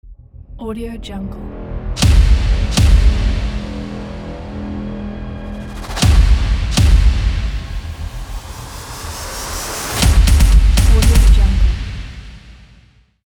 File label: trailer logo